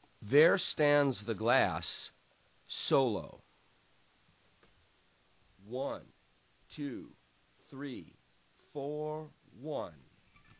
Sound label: solo slow